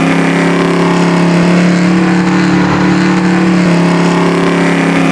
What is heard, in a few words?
fan motor full throttle loop, airboat; XDM; vehicles